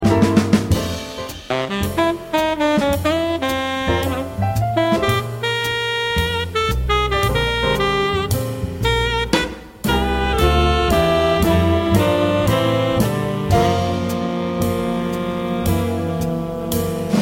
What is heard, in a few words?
Reeds bridge